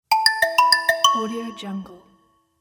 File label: mail sent